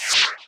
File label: Win Close